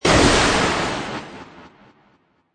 Magical Blast Airy, Magic sound of casting spell, conjuring or wizardry; Witchcraft ritual summoning elemental or otherworldly creature; Blast Sound Effects